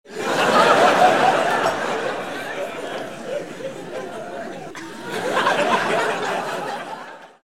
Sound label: laugh twice